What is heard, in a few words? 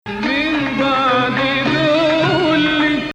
Bayati octave